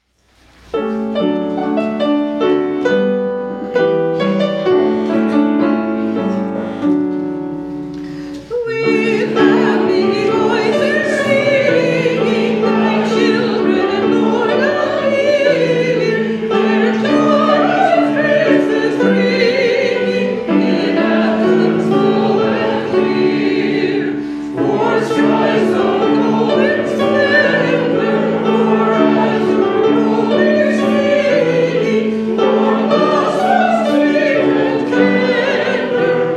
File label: Hymn With Happy Voices Singing; Hymns